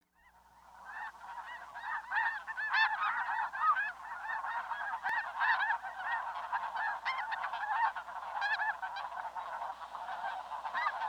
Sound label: Snow Goose; Name That Tweet